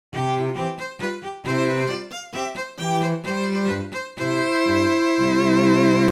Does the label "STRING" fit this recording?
Yes